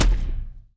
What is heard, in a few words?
Fist Slam